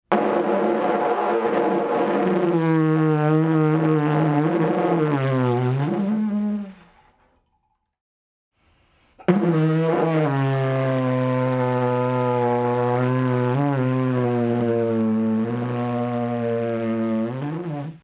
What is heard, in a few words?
DIDJERIDU